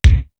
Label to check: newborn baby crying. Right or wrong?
Wrong